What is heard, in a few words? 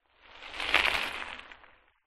transport bicycle pass fast gravel surface